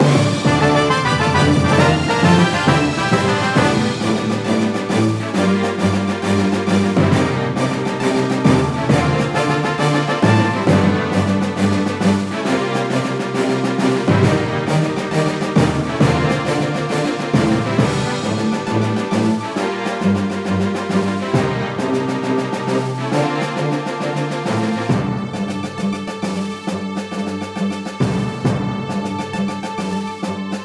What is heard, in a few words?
Leave Port Fanfare; 3ds; steel diver